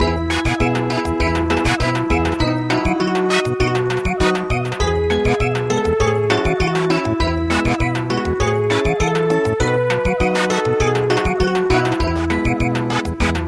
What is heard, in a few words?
Samba; Happy New Year Greece